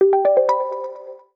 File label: Ripples, notifications; LeEco Le 2